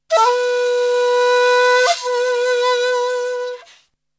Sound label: Flute; ZERO G - Datafile DISC 2